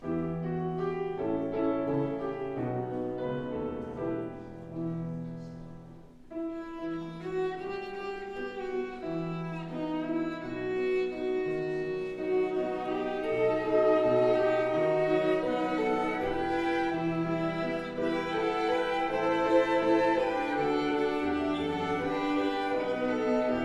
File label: Instrumental; Fuer alles sorgt der Vater mein